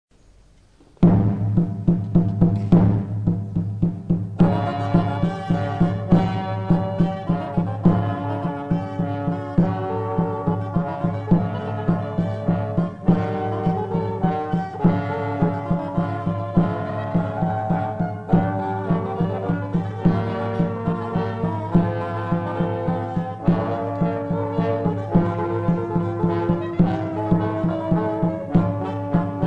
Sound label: Anon Bass Dance; Dufay and Anon 1; Dufay's popular rondeau on the subject of marriage